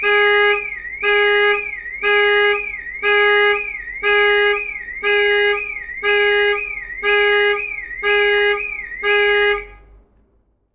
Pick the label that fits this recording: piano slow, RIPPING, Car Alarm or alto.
Car Alarm